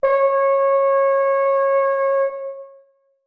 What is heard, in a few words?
horn c#5; Sonatina Symphonic Orchestra